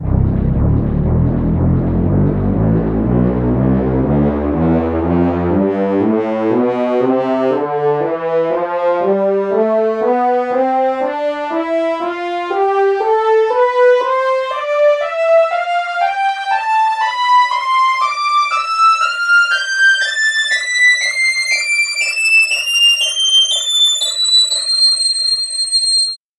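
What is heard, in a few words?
french horns scale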